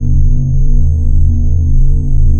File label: ElectronicsHum